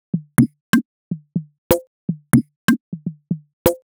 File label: Top C# 123bpm, House Drumloops; Zero-G House Fabrik Drumloops